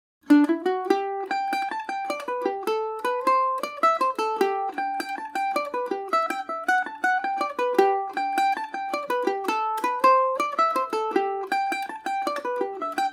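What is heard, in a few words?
G major, Irish mandolin; The Humours Of Kilkenny; Paris Swing Macaferri mandolin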